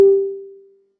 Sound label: bong